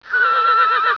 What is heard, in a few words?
HORSE RAC; Canon; CameraWindow